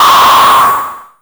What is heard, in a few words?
WN FM HIT, ANALOG PERC; Zero-G Ambient 1